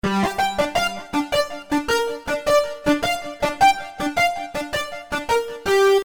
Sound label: Liq Lead G